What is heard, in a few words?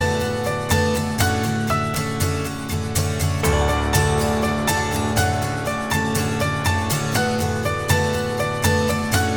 Acoustic